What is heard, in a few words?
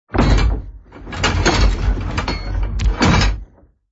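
anchor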